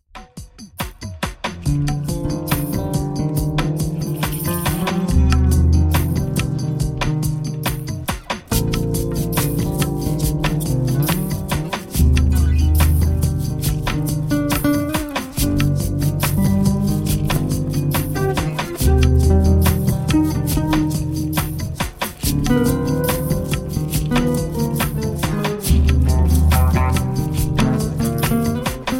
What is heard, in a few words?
Desert Ritual, guitars of light